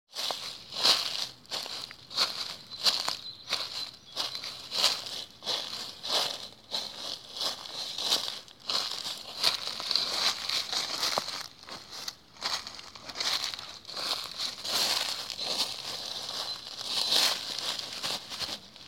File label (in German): Schritte auf Blättern Wald; menschliche laute